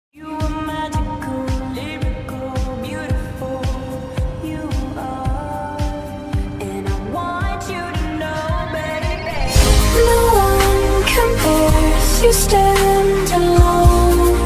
slowed ringtone

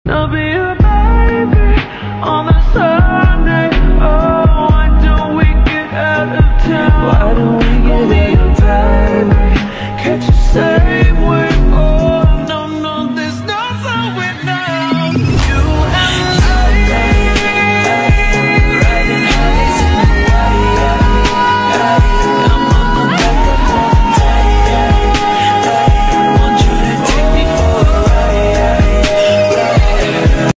english ringtone

